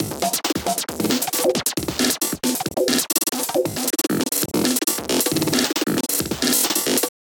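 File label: drum beat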